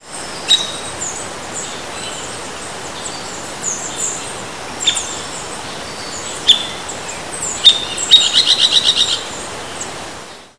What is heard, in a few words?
Veniliornis spilogaster, SP